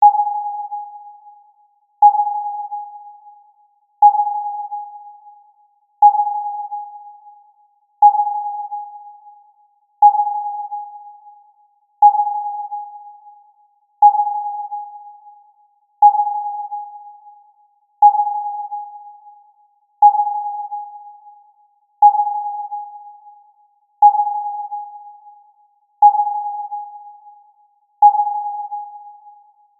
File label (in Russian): sonar 830hz sintezirovannij